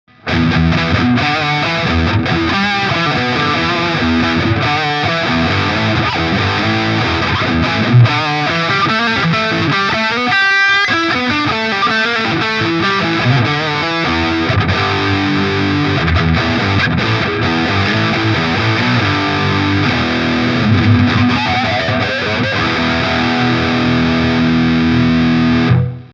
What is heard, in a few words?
Diezel VH Micro Amp Head Gain 03 h EQ flat Deep Presence 12 h